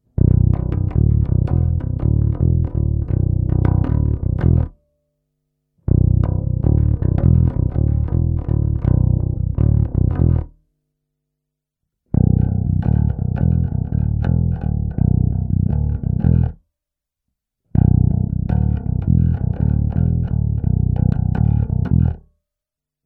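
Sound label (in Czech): Struna H, basa; Vlček Basses Fineso 5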